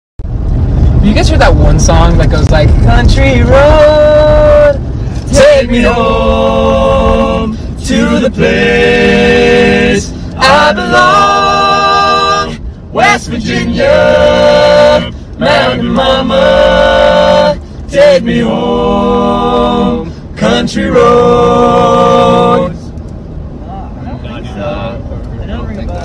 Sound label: country road singing